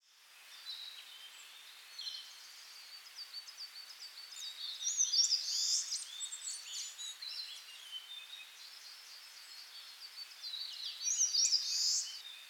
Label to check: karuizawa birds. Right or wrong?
Right